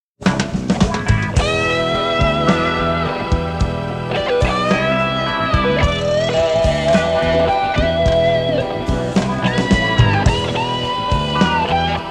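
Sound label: Solo, guitar; Torino, Italy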